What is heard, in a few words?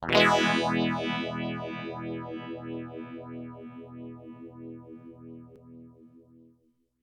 strum full depth regen at noon rate at noon 4 stages